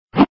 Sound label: move card